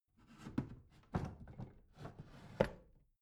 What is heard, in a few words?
bathroom drawer open rattle